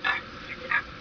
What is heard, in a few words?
turkey vulture